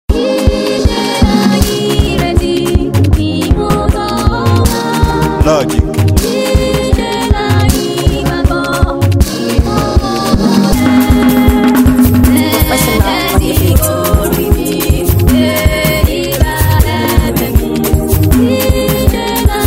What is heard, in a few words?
Professional Beat